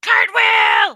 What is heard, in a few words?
cartwheel